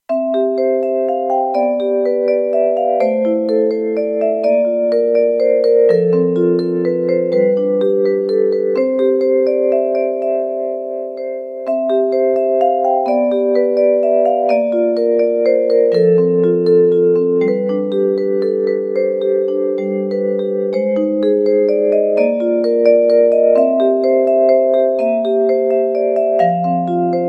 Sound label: theme; Dinothawr